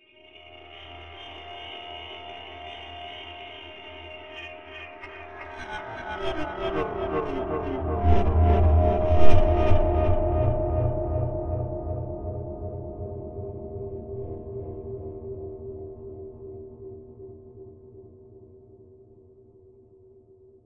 evil pad

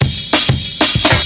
giant space dnb, DRUM LOOPS and BREAKS, 191 bpm

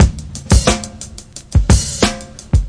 classic brk; VIBRIS CLASSIC BREAKS

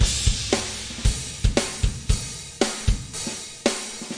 head banging huge kick snares, 115 bpm

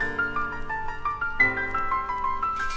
172 two chord piano arpeggio; SAMPLED MUSIC LOOPS

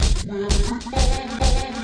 130 weird vocal floppy drum

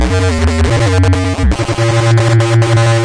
kolo madness 1 bar 3 beats, bass loops

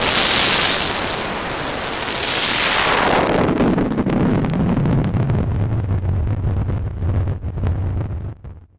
noise, Noise Sweeps

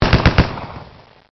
Machine Gun

